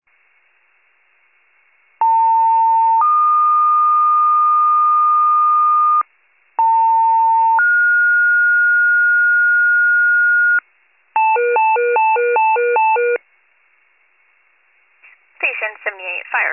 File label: FIRE pager alert